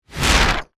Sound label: Flash Back Hit; Sound Design; BlastWave